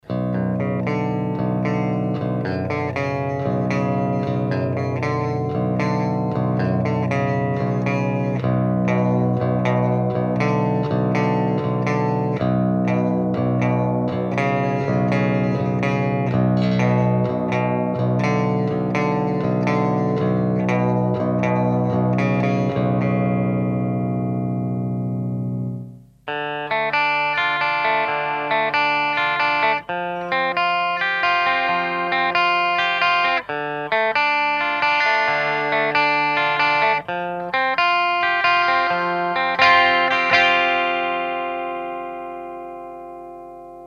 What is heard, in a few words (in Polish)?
schecter czysty, 7string; Eclipse